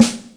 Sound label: Cool Dry, Yamaha DTXpress Acoustic Snare